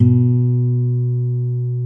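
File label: B1 classical guitar; InVision Interactive; Lightware VOL-1; Instruments & Percussions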